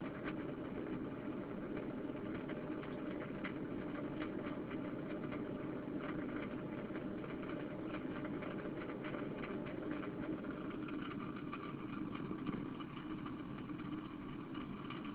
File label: sink water